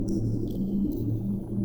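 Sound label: Music Dpad Evaporate 145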